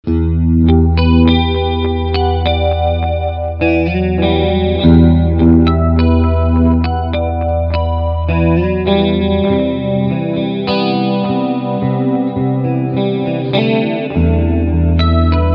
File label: Chorus Delay